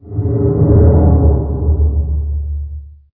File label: Ambient